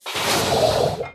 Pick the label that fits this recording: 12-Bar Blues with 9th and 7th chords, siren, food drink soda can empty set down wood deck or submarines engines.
submarines engines